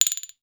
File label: KLACKERS; SPOON